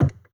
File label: Wood Foot; Zomboid 41.78.16; Project Zomboid